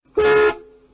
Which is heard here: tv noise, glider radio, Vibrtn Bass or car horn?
car horn